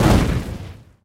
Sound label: fire shot; inlogic-castledefense